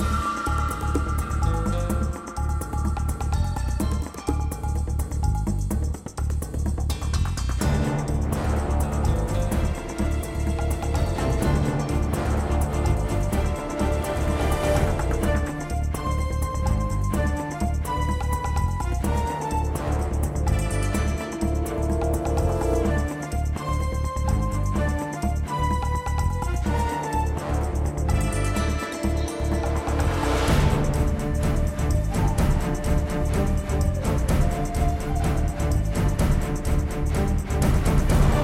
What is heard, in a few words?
tense sneaky; The Confines of the Crown